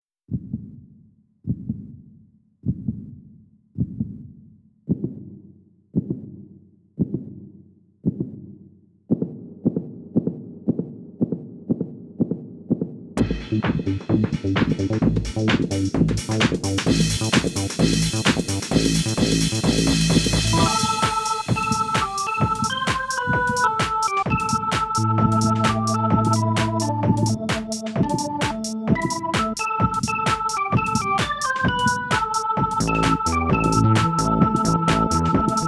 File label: JazzRock